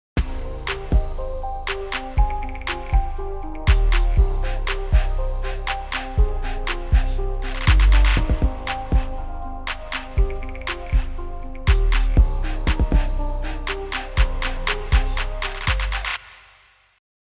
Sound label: 200 hz cut